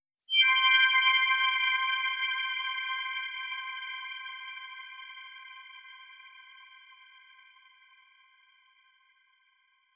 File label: bg shimmer C Maj; fx; base pack